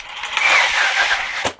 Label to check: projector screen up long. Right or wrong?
Right